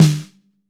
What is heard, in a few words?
MAPLE SNARE